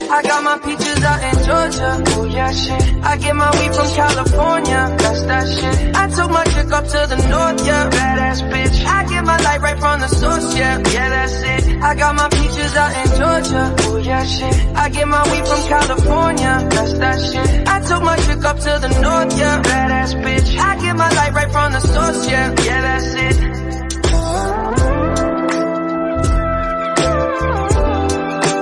English Song Ringtone

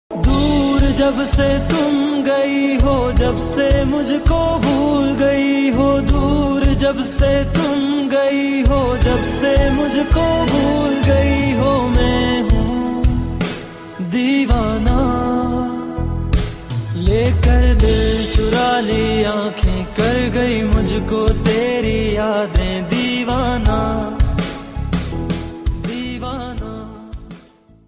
Sad Song

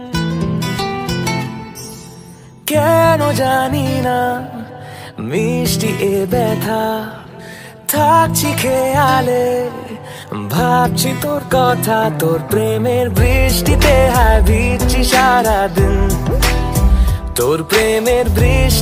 Bengali Song Ringtone

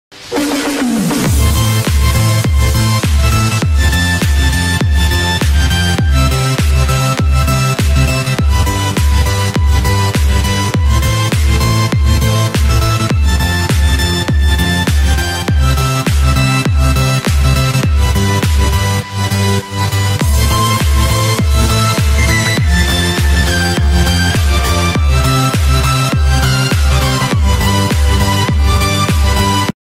Instrumental Ringtone

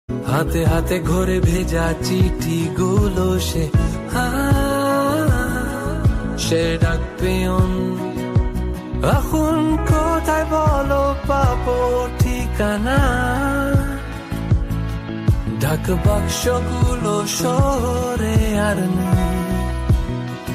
Bengali Song Ringtone

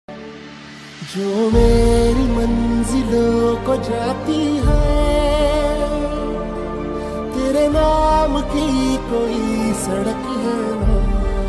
New Hindi Song Ringtone; ringtones; Dhadak Movie

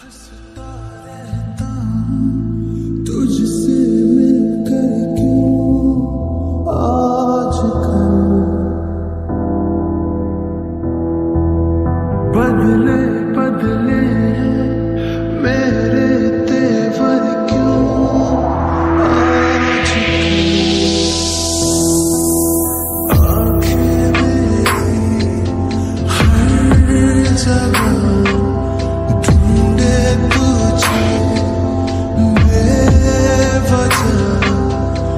Romantic Song Ringtone

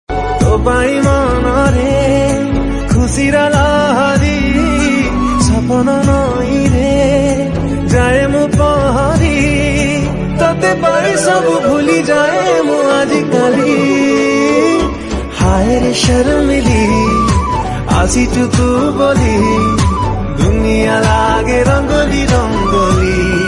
Odia Song

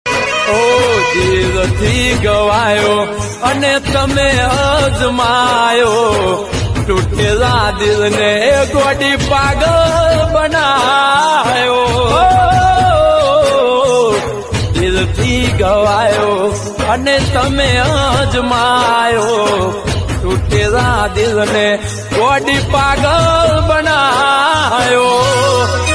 Gujarati Ringtone

